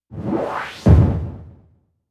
Noise Sweep FX; Sonic Foundry (Sony Creative Software); Dr. Fink's Funk Factory

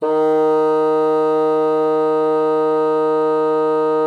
bassoon D#3; Xsample VOL-6

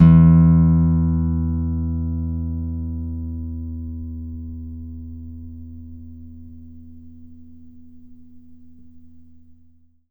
NYLON GTR

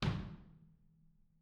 R B Kick Room, drums, One Shots; Sonic Foundry; Sony Creative Software; Drums from the Big Room The Mixes; R B Kicks

